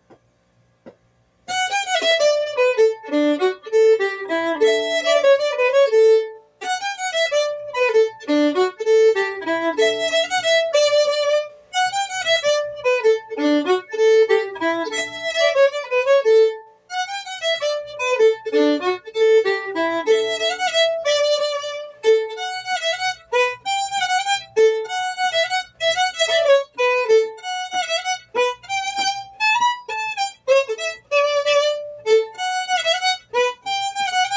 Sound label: Polka; Dennis Murphys Polka